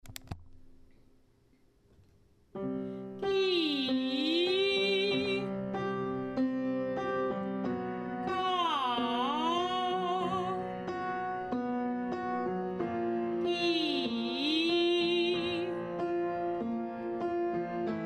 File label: Gi Ga Descending slide